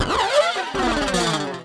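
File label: Yamaha scrape, Bass; Masterbits CLIMAX 7; PATITUCCI BS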